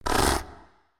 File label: snort; the wolfs night